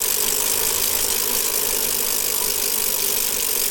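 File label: Mountain Bike Coast Fast, bicycles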